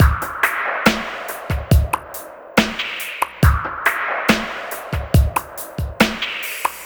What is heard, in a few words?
Slomo Beat 140 BPM, Loops, Slow Motion Beats